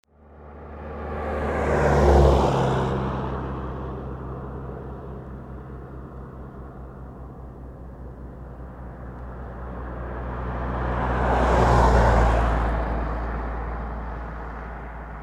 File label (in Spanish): el bosquicillo; catamarca; rn 60